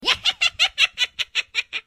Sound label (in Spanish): risas; zoeira; red larva